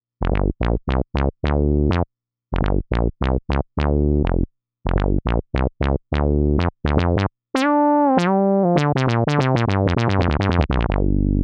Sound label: Juicy Funk